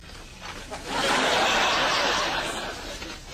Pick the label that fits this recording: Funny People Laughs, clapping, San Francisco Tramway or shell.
Funny People Laughs